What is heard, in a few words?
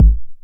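909 BD tape, kick drum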